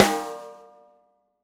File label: snare, gretsch kit